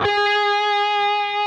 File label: PRS A, Guitars, LEAD; Spectrasonics; Hans Zimmer Guitars VOL-1